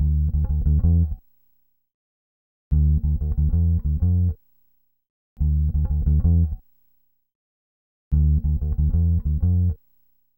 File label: bas D min, Hip Hop & RnB; ProSamples vol.34 - Hip Hop & RnB 3